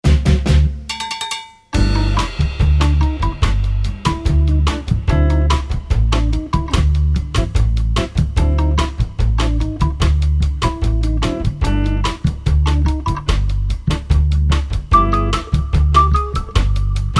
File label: Bossanova; Hyppönen&Enbuske; tunnarit